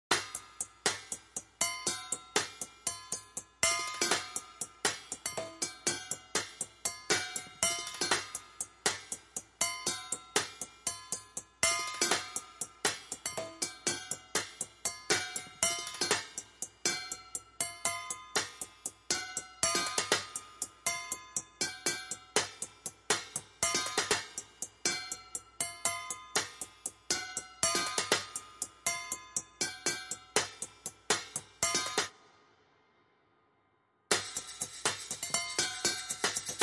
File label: High Drums